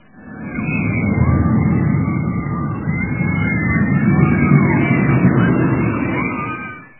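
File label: exclamations of fear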